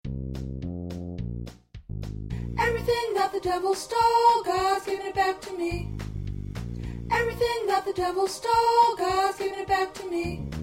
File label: Alto